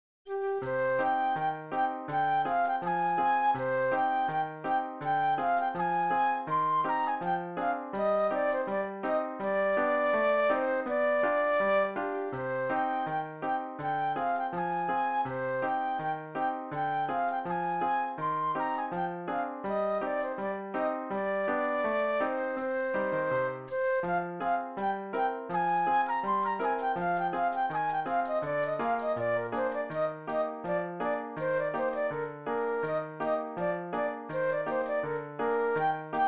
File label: Flute Solo; classical